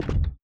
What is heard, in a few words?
foot